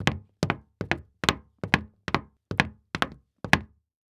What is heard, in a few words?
Foley Footstep Hard Sole Dress Shoe Fast Walking On Hollow Wood Surface; Foley Footsteps